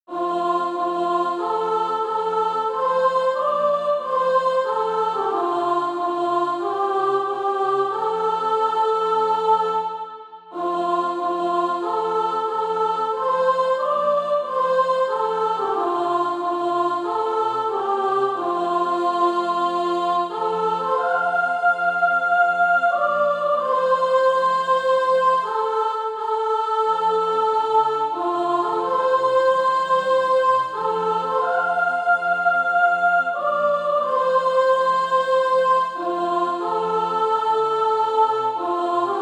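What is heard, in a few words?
sop, Practise Music; Call for Workers Sheet Music (SATB)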